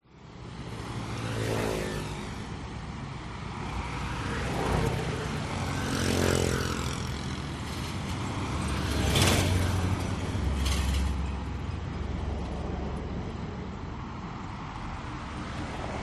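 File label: traffic pass by cars motorcycles trucks cobbled speed bumps ben tre vietnam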